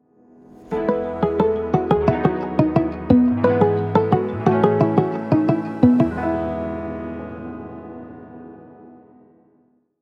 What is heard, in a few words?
simple pluck intro logo